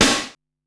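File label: Crack Snare, Korg Wavestation-SR, Drum Kit; Wave 455 - Drum Kit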